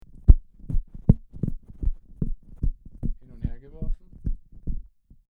Shure SM58 Handling Noise

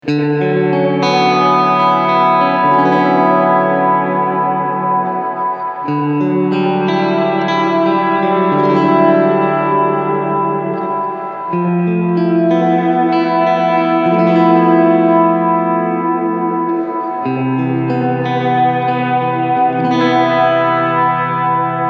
Spring tone closed

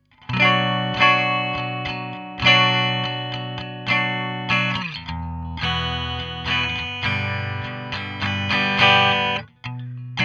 Soyuz the Launcher sm 57 Egit cl dry

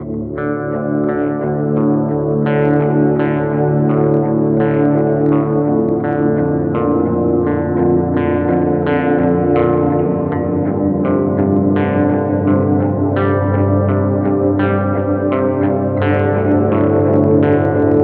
death by audio rooms gate

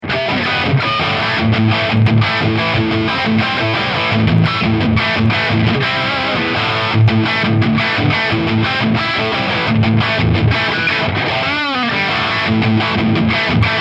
Overdrive Voice Blend

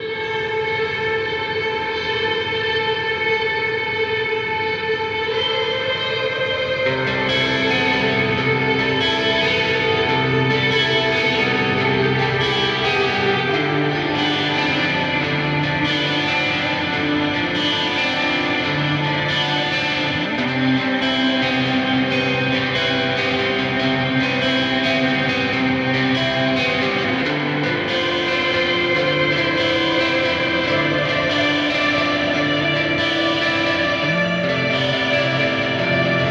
Neural DSP Quad Cortex Brit 2203 Reverb

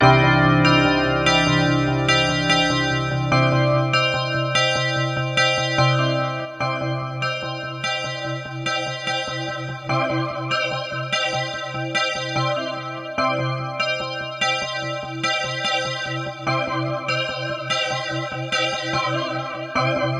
Chorus Bells

